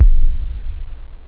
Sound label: distort, drums technobd